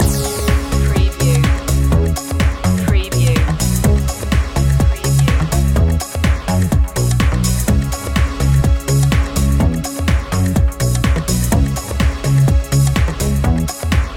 dancefloor loop

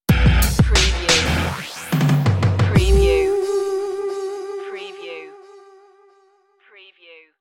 slow rock stinger

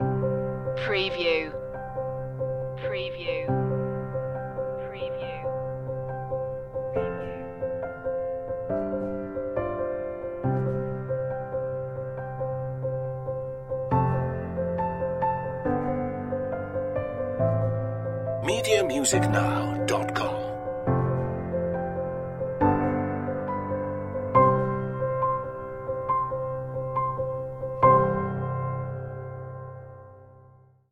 solo piano

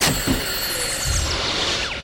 Stinger; Sound Effects Bible

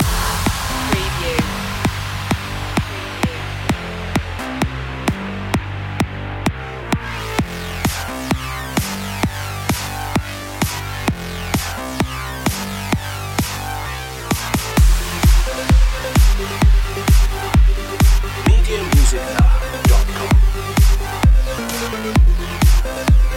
jackhammer loop

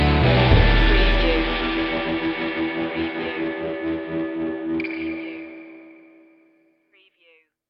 swamp swagger stinger

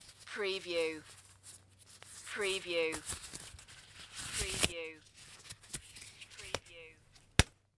Latex Glove Put On; sound-effects-bible

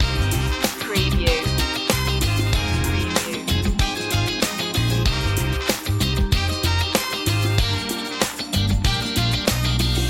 forever funk loop

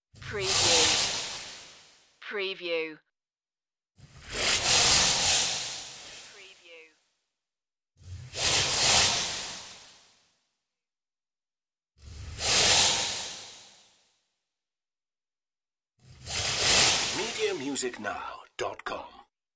transform hiss swooshes

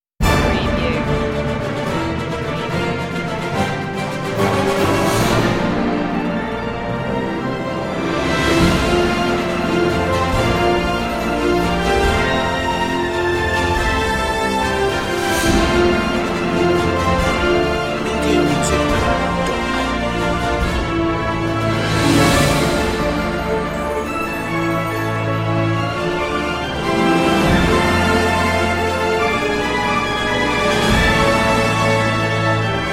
no choir epic

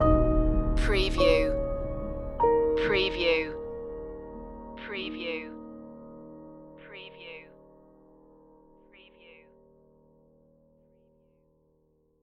sadness and depression stinger

